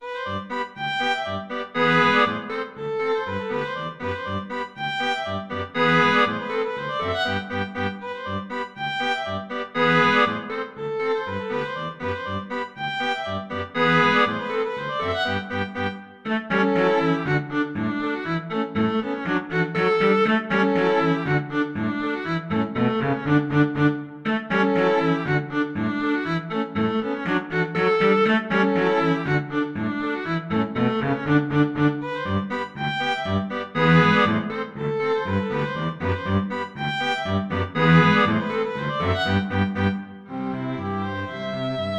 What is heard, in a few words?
polka string trio